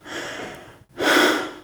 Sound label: male, Realistic Fatigue Voice; oblivion